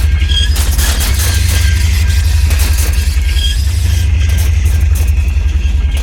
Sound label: shoulder tunnel in; Blind Drive